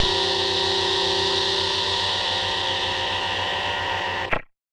Casio, Glitched, malfunctioning synth

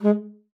STXP G#3, ALTOSAX; Xsample VOL-2

